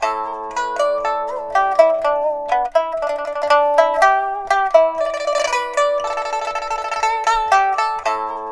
PIPA, 90; INIS - Opium

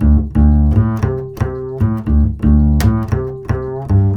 CHASE D, Bass; Spectrasonics; Bass Legends

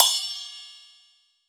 Xiao Bo; Cymbals

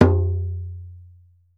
EARTH TN, EARTH DRUMS

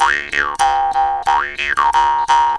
D 93, JAW D 93BPM, Primitive Instruments; Didgeridoo and Other Primitive Instruments

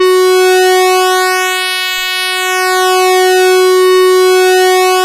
SAW F#3; Best Service Alpha Dance vol.2

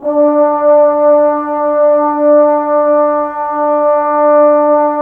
brass, Woodwind Brass Ensemble; Miroslav Vitous - Woodwind Brass Ensemble

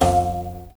MOUTH BOW LO, Heart of Africa

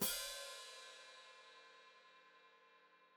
Hat Closed Open, Hi-Hat

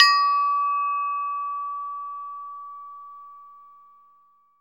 prc C#, Ambi Perc, Chillout; Best Service ProSamples vol.36 - Chillout

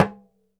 Talking Drum; E-MU Producer Series Vol. 7 – Old World Instruments; Drums&Percussion

